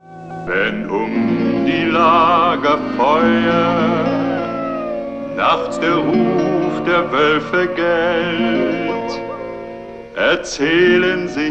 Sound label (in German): sung; Disneys "Lobo der Wolf"